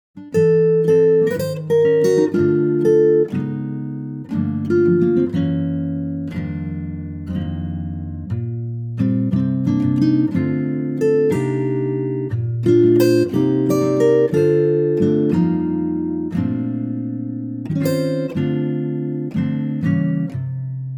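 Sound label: acoustic guitar Bb, backing track; key - Bb - vocal range - Bb to Eb; Wonderful acoustic guitar arrangement of this gem from "My Fair Lady".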